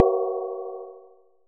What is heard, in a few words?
impact Bell heavy; Kenney's Sound Pack; Impact Sounds